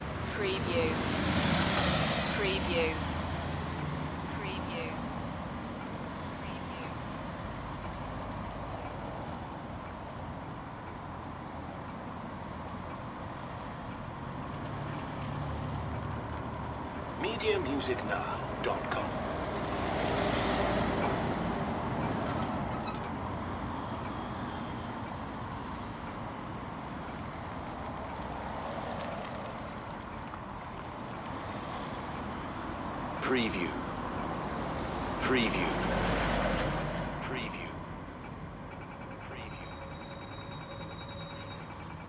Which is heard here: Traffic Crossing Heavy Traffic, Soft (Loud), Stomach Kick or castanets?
Traffic Crossing Heavy Traffic